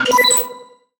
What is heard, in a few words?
tonal oneshot B; Pop; Slate; PASADENA Pop Sample Pack; Tonal One Shots